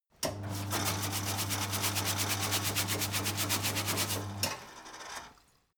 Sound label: mechanic sink running short brush metal